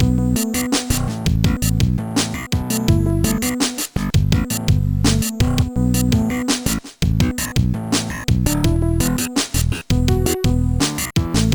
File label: loading; desert strike